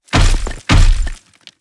Fellwing Walk

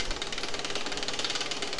static lift; hp colossos

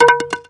Baseball; Sfx Baseball

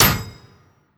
Debris Death Hit; Legend of Keepers

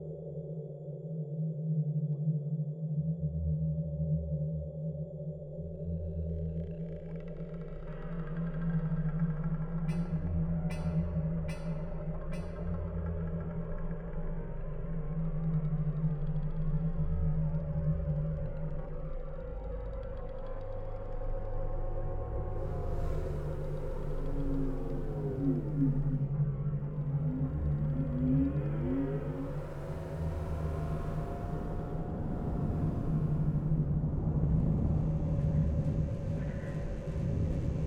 Factory Ambiance; Unsighted

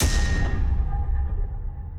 Impact

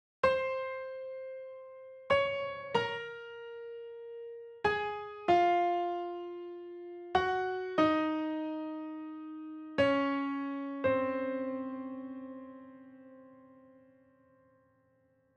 Piano; MuseumBoss Transition

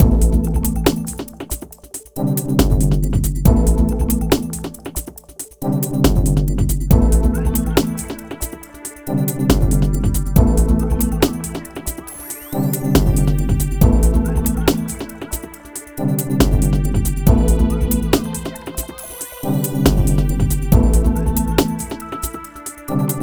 music factory bpm139; factory switchroom; Donkey Kong Country Returns HD

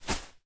Footstep Beanbag

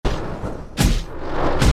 Walk; starbites

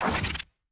Armadillo Roll Start; enemies; unsighted

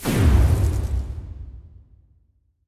Power Cut; battle suit aces

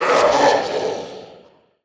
Finalboss Vox Taunt

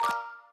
Block Match; In Block Match01

